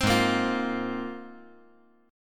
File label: Ab 6th Add 9th Ab; strummed; JGuitar